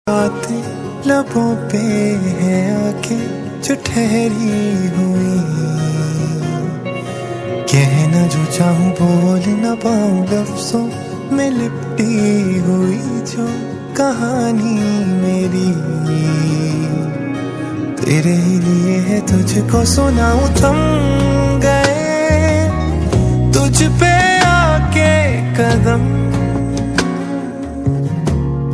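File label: original soundtrack pakistani ringtone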